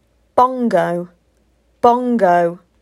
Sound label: Bongo